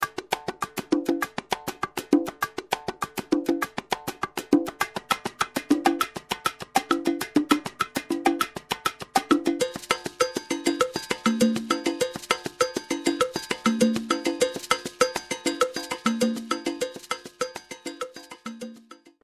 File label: salsa